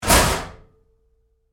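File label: Door Locker Close Roomy Medium, Metal Door; Doors; BigRoomSound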